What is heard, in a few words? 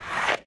draw bow; Mount&Blade Warband